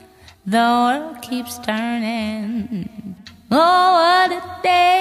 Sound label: Acapella